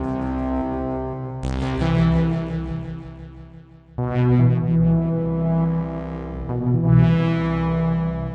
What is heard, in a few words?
115 interesting verby bassline